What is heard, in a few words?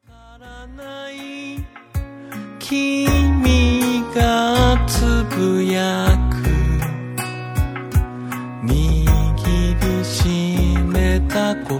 yell